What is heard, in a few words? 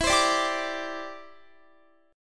E Minor 7th Sharp 5th, down, Guitar; Em7#5; strummed